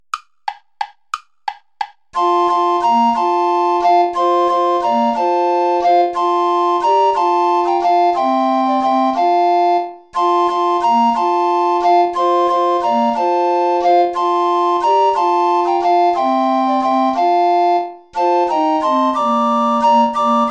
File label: play along without tenor recorder; gastoldi giovanni giacomo; dir ist freude